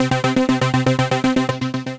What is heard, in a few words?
SAW; Synth Rhythmic